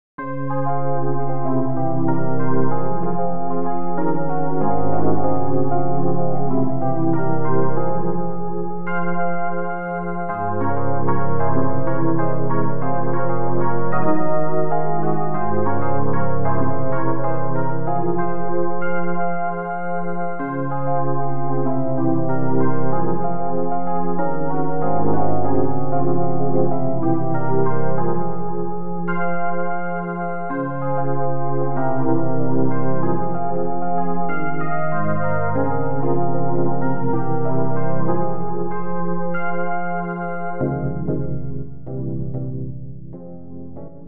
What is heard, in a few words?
tape; prism by warmth dev